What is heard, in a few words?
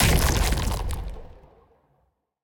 Dth; TaMaGoa